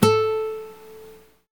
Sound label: GTR Nylon, Nylon Martin; Club-50 - Foundations Roland